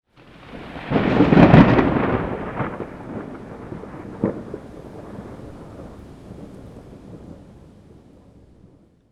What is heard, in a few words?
thunder